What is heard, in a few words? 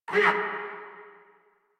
Pain Crewmen; Warframe; Corpus Crewmen; Crewman D